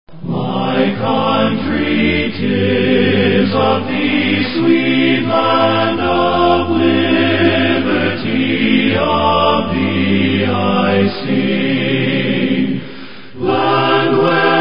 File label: America, My Country Tis of Thee - choir; July4